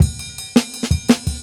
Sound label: Jungle Breaks